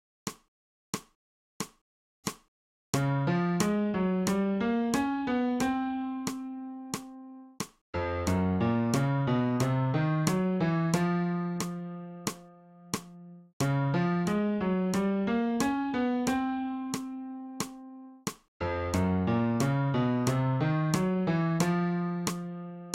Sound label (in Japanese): 新フレットレス